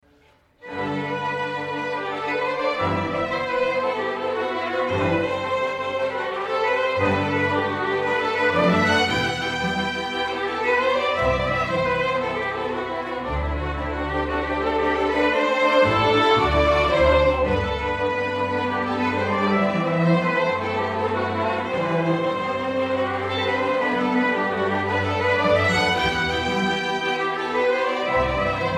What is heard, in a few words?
Suite for Strings Praeludium; chamber orchestra